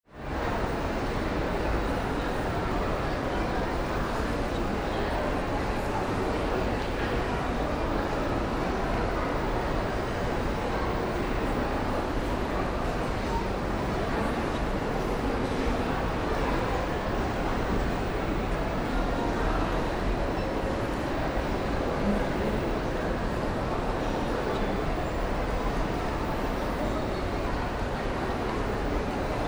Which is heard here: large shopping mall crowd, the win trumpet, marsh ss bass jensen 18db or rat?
large shopping mall crowd